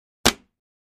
Gallon Jug Hit Hammer, plastic

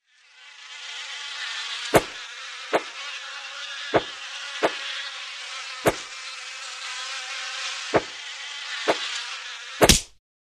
Many Flies Buzzing

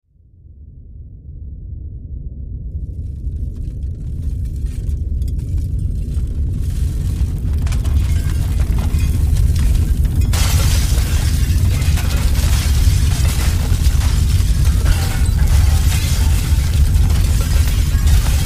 Earthquake Debris

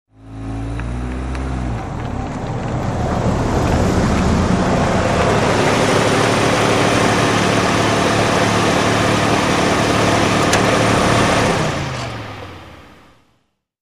Sedan Park Manv Off

